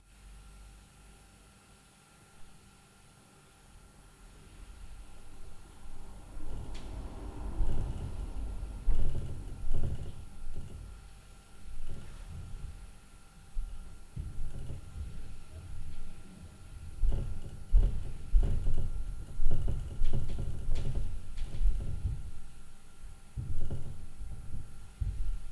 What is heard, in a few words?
LF UPSTAIR NOISE, Night Noise